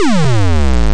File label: vidgame gliss down; Alarm Sounds